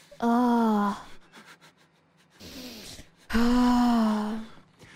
deep breath

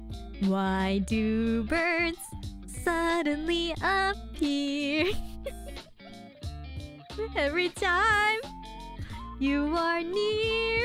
Singing; IRySinging

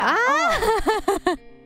laugh

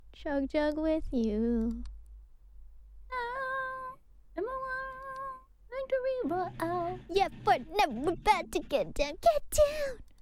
Singing

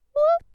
hope noises

